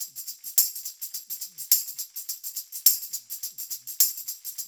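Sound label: loop, tambourines; InVision Interactive; Percussion Slam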